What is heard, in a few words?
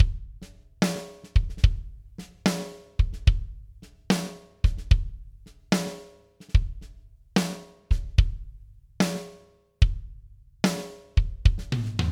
dbx 676 kick snare tom dry